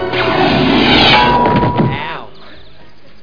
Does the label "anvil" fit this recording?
Yes